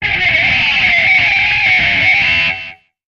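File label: Music Guitar Pick Scrape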